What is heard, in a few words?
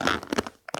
Hub-Train Strap Squeak